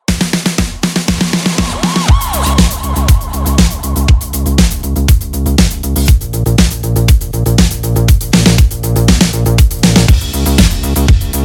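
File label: Trailer, drums; chris zane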